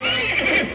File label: horse